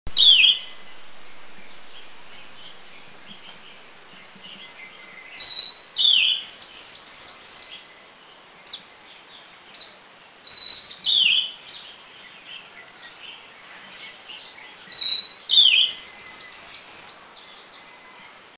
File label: Steeres Liocichla Duet